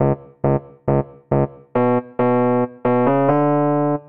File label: electric piano example